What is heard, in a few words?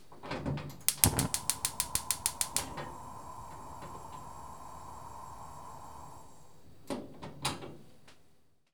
gas, ambient sound in the house